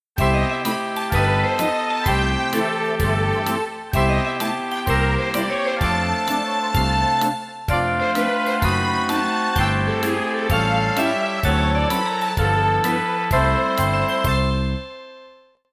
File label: bgmusic